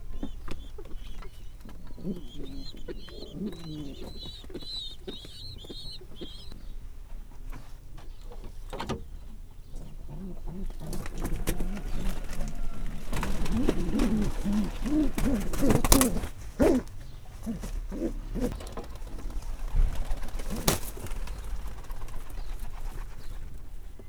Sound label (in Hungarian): idegen galamb érkezik; állathangok; paraszt udvar; galambok